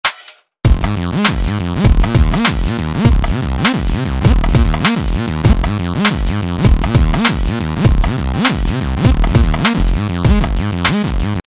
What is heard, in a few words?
street rhythm